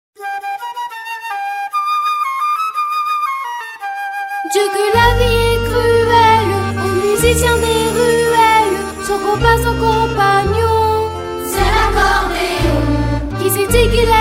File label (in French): Accordéon